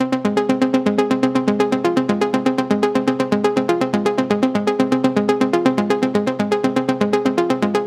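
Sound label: 122 BPM Arps C major A minor Tonic C; arp essentials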